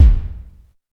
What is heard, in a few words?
low steel kick drum sample e key, bass drum sample tuned to the E note; kick drum samples